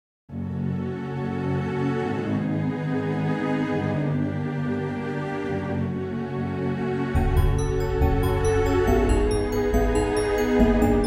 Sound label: halloween music short